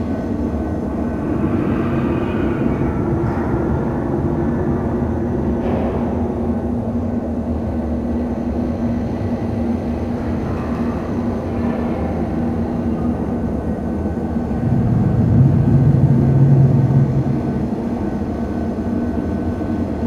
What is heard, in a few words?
Galleon Corridor Amb; Warframe; Ambience; Grineer Galleon